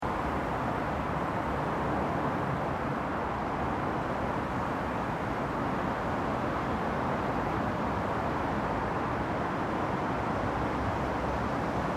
Traffic Street Cars Trucks, Medium distant motor traffic; Some occasional trucks passings. Car stops, open and closes door. Some big trucks